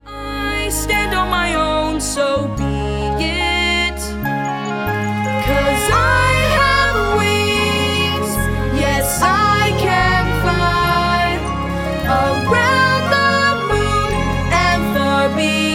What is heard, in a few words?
Vocals